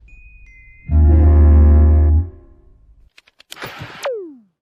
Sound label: turn on tv